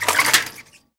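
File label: object rattle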